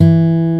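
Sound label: E 2 MARTIN, acoustic guitar; AKAI S6000 CD-ROM - Volume 1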